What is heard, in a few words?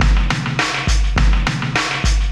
Dance Mega Hip Hop Electro; EastWest